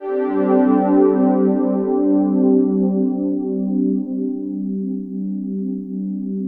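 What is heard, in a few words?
soundtrack, ethereal atmospheres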